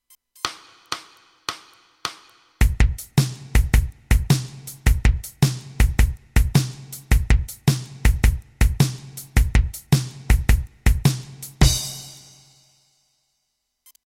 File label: blues shuffle